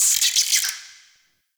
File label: Interior Spider Chatter; Warframe; Duviri Murmur; Ambience